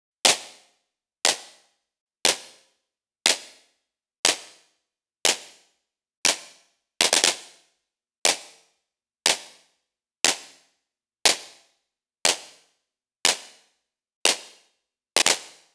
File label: Clap, EDM; Sonic Foundry (Sony Creative Software); Afterhours EDM; Retro Active